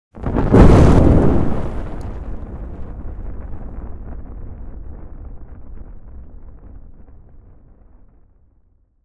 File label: FIRE IGNITION BIG Fire sound effects